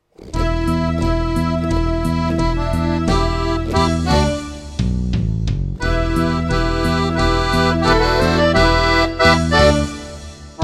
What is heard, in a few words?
Rock Café